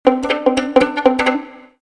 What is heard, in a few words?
ringtone, violin ringtones